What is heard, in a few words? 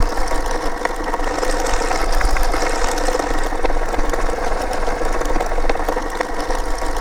spinner Skate; unsighted